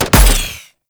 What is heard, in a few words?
Miter Shot, Weapons; Warframe; Tenno